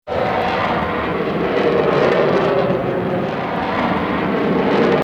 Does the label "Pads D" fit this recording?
No